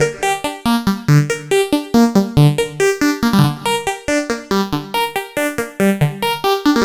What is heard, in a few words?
Arp 140bpm G, Trance